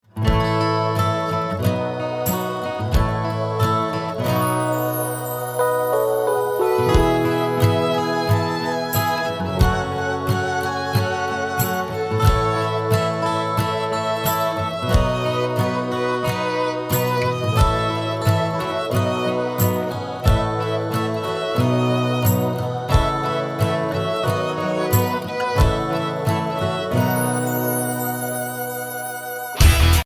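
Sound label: the spider kept on trying instrumental; mother goose; badwolfpress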